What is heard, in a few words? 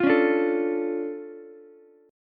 D Minor Major 7th, D, Ukulele; DmM7 strummed; JGuitar